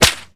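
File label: sparkler popup; Birthday Party Bash; Wii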